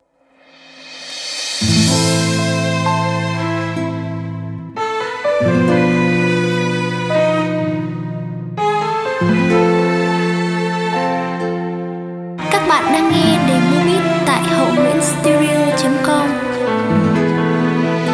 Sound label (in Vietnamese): beat